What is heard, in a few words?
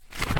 Paper, Handle, Notepad, Page Turn